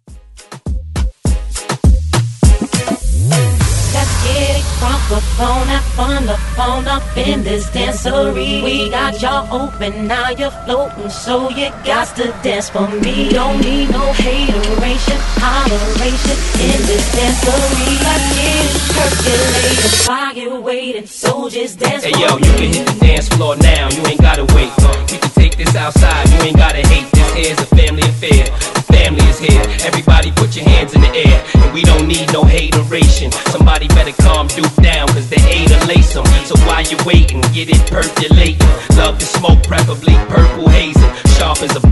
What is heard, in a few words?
Moombah Vocal W Rap